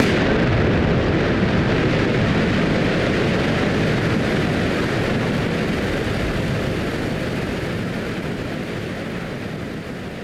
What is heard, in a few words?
Noise Bomb Harmon; musicradar; bomb factory